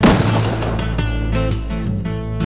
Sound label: death; singe